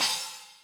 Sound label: crash, Millenium MPS-400